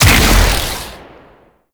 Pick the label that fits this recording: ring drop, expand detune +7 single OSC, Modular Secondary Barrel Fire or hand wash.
Modular Secondary Barrel Fire